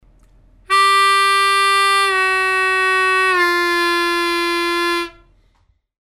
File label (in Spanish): bend asp, armonica